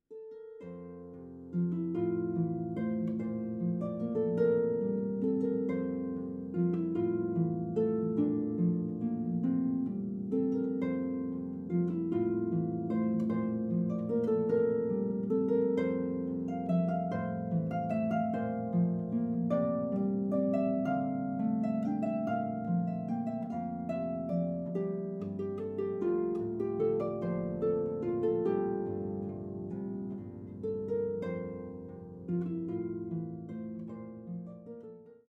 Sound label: Waltz, harp